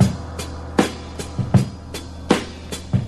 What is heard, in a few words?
78 bpm fresh drum beat e key